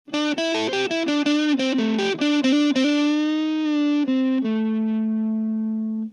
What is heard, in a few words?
Strat TS9